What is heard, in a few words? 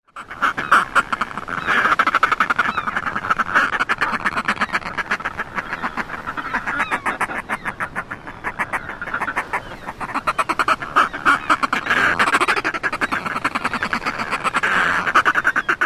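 calls at colony, northern fulmar; UK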